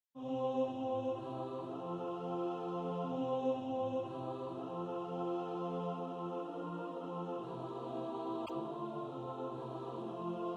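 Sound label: alto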